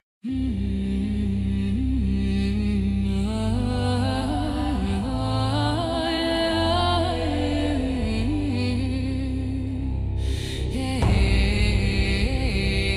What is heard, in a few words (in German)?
Advent Advent ein Lichtlein brennt Death Metal